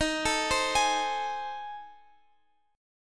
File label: A Minor Major 7th Flat 5th